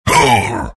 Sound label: pain; Dota 2; beastmaster